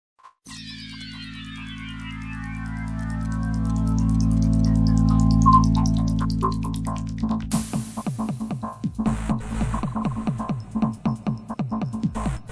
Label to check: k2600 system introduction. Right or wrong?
Right